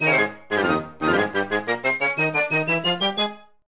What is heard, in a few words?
Win; Super Skywheel